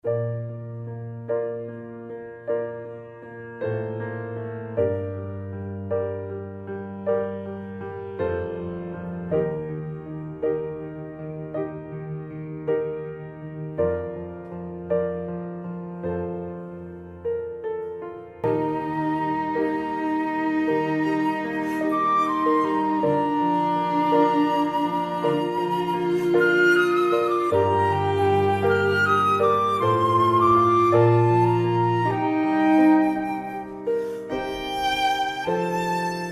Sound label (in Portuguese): flauta violino e teclado